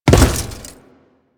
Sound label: Step Default, Footsteps; Warframe; Grineer